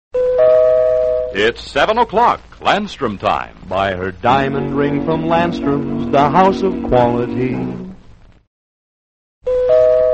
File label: radio jingles; landstrom jewelery; allied radio artists